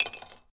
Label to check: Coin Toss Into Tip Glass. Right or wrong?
Right